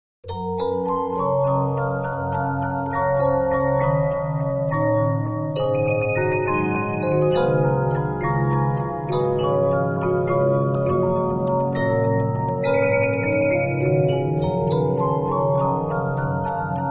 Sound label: Regina, 15inch disc musicbox